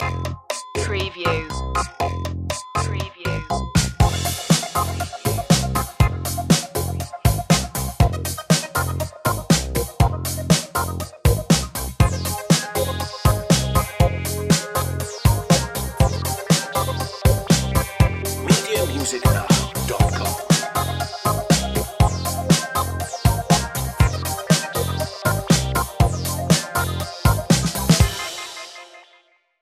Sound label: electrowave; 30 secs